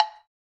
Wood Block

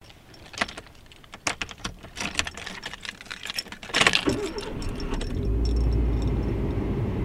Keys In The Ignition

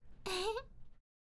Happy Girl

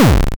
8 Bit Damage

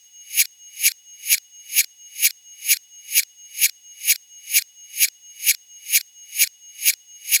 Finger Cymbals Reverse 130bpm

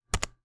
Computer Backspace

